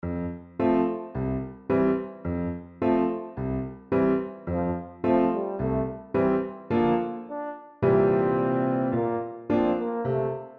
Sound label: dance song french horn with solo